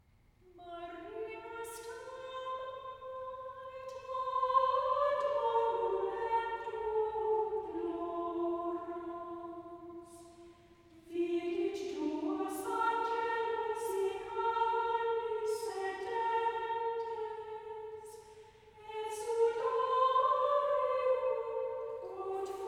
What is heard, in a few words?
Antiphon; Maria Stabat